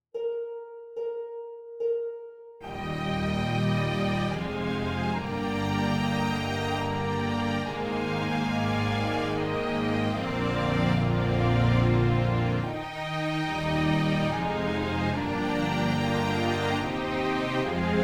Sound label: Chorale Trio